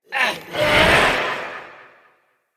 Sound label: Bell Boom; Enemy; Characters; Corrupted Sproutfolk